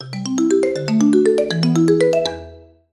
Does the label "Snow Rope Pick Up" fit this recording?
No